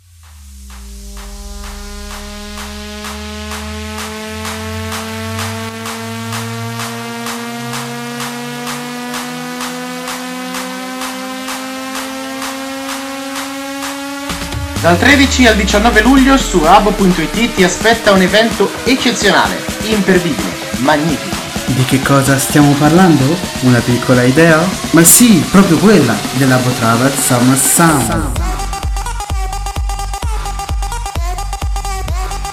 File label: Summer Sound Jingle